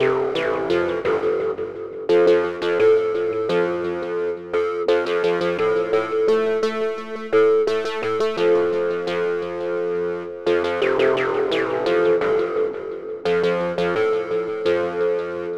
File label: MS-20 DirtyDelay Stereo Motion; Metric Halo v4